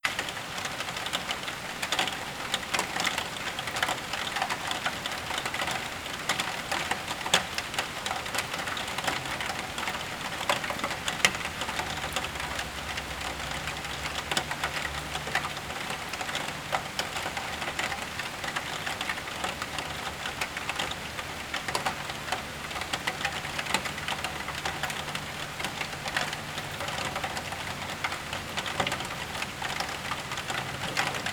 Sounds of Nature Relaxation Pink Noise Organic Nature Sounds, Static Rains